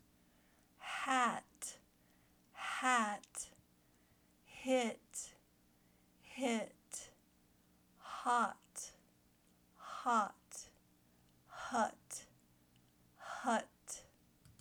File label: hat hit